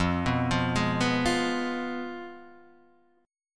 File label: F Diminished Guitar Open D; down; chord shape